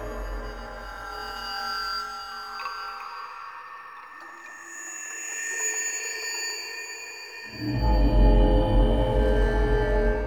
Exterior Hub Loop, Ambience Infested; Warframe; Infested Eris; Amb Loops Exterior

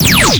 Fire Shot 2D, Weapons; Warframe; Bronco Prime

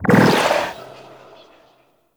Puzzle Orb Spawn; Warframe; Duviri Murmur; Medusa Puzzle

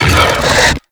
Scarab Swarm Buildup; Warframe; Mummy

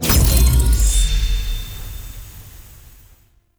Glyph Puzzle Complete; Warframe; Duviri

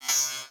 Beep; Warframe; Corpus

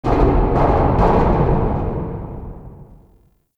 Cargo Knocks; Ambience; Props; Warframe; The New War; Zariman

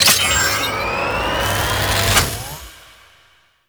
Gas City Lightning Rod Fully Charged; Warframe; Corpus Gas City; Gameplay Remaster; Ambience

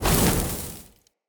Short Sword Fire Throw; Weapons; Elemental

